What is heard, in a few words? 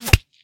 bullet meat, guns hits; Weapons